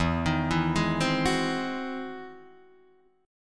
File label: E Augmented Major 9th, Guitar, Open D